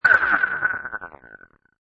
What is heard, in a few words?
Space Sonar Distort, Lo-fi, distorted space sonar; Science Fiction Sound Effects; Lynne Music